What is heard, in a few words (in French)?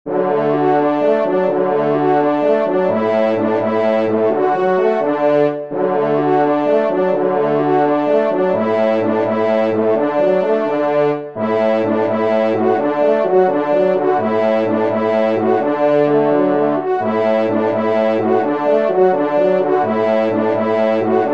Allegro de chasse, trompe